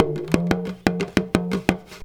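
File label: small tama groove; Best Service; World Colours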